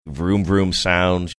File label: vroom vroom sounds